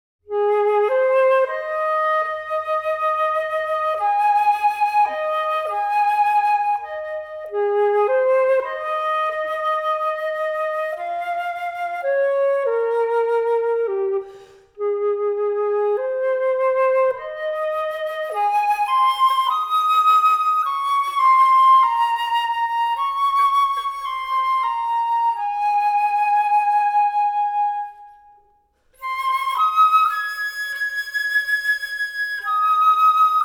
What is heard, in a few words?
Amourous arpeggios performance, flutes; flutes grade 5